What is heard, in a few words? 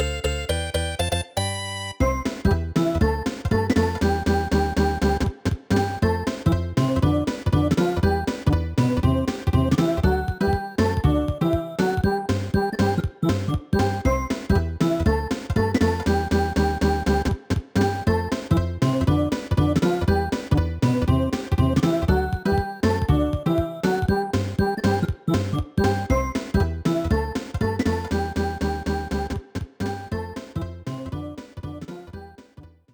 Bonus Screen BGM; SNES